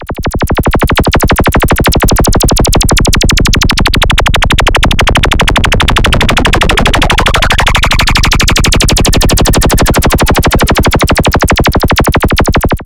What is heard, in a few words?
pulsating; Zero-G Ambient 1; PULSATIONS